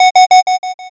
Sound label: alarm